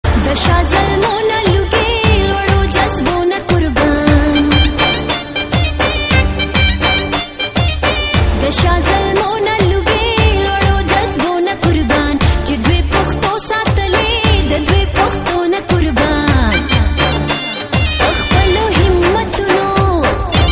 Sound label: pakhtoon new ringtone